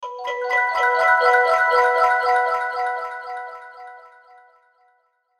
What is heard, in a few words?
Logo Xylophone Reverb; Logo Xylophone Delay Reverb; Sonic Logo